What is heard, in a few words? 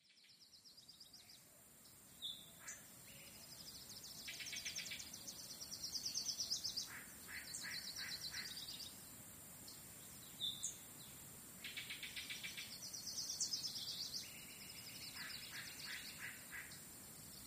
Birds Chirps Ducks